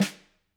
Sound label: K2 room Snr, drum